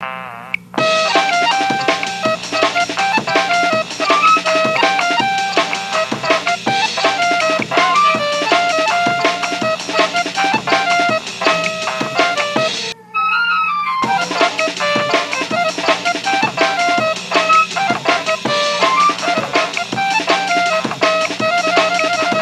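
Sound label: nokia ringtone arabic amen remix; lolicore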